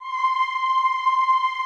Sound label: EEH C5, Classical Choir; Best Service; Extended Classical Choir